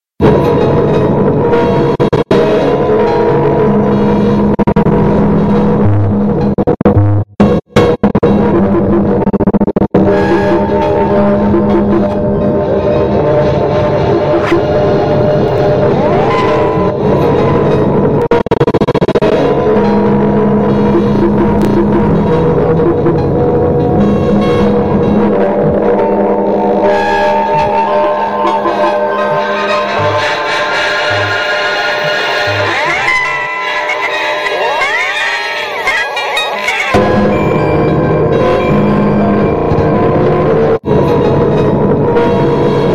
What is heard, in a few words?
huffing gas from a starry night guitar